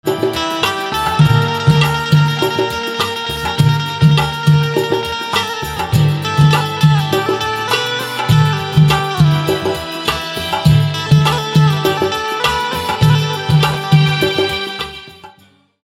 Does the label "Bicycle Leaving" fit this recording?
No